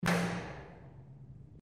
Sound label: Door unlocks